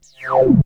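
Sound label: reverse sweep; ANALOGICS 1; Back In Time Records - Big Bang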